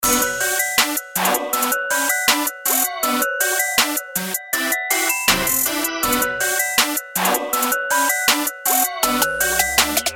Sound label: Kit Beat